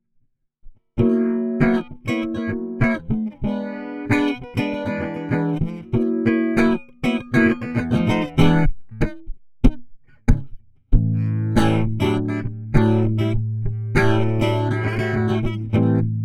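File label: acoustic guitar LOVE phaser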